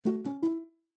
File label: Notification Ringtone